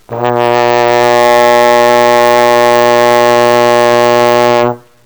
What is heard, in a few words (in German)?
posaune; 120 Hz Posaune